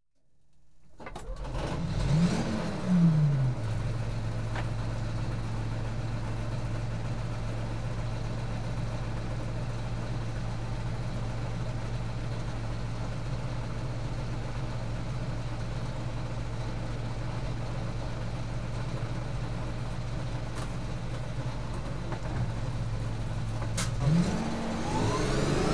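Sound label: Warfare, Armoured Personnel Carrier; BBC Sound Effects Library; Explosions Guns Alarms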